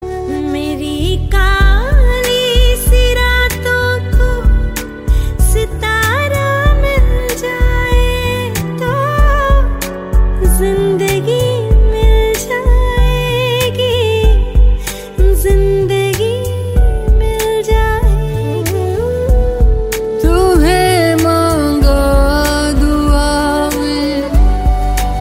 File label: bollywood ringtone